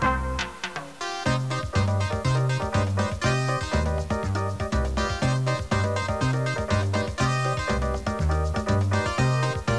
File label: Samba